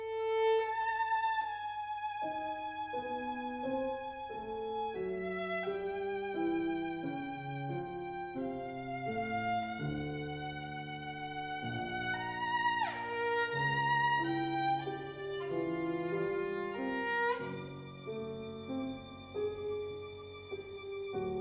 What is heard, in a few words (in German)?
2. Sonate Violine & Klavier; 2. Satz; Aus 'Nouveautés' 2. Satz - Alexander Klemmstein/Violine - Katia Bouscarrut/Klavier